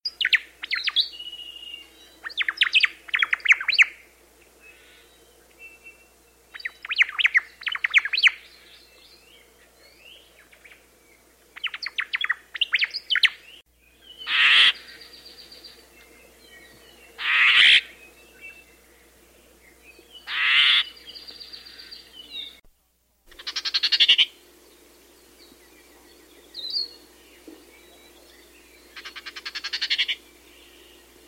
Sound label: Omao